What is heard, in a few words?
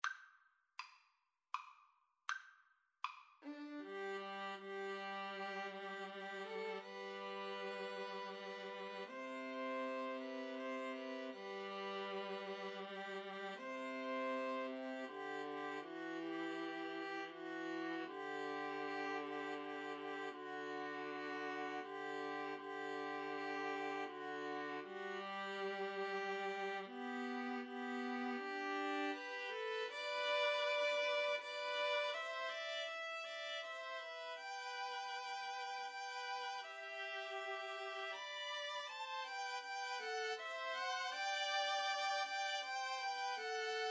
Shenandoah, 2 violins viola